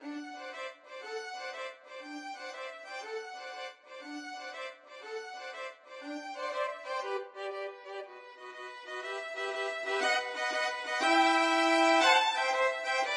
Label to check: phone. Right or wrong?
Wrong